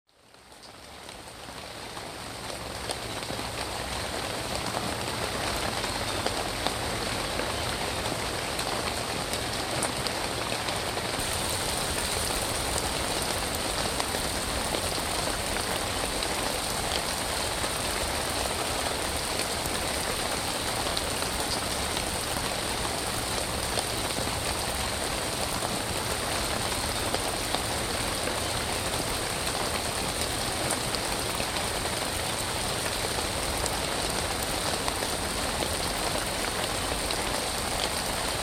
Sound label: Another Rainy Day, Soundscapes